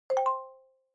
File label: Xylophone Multi